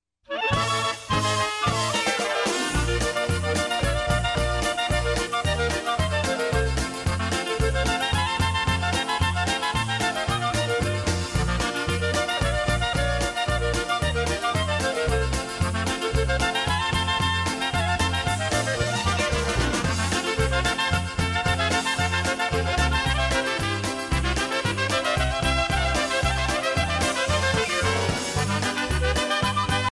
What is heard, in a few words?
Clarinet Polka